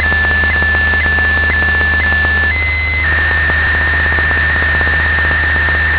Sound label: fax, office; Fax 1